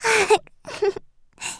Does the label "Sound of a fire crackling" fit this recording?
No